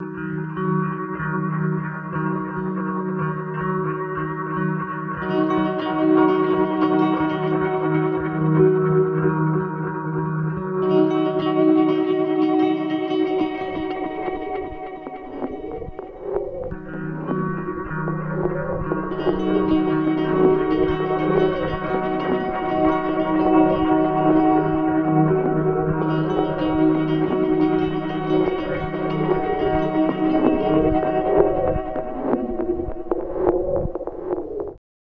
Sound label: Shimmering D#3; Zero-G - Ghost In The Machine